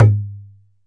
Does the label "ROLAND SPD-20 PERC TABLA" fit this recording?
Yes